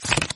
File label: Card Flip Many